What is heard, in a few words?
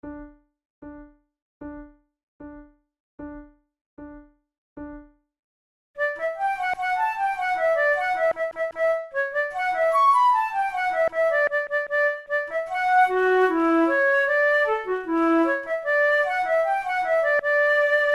pour la flute duos allegro; devienne; nouvelle methode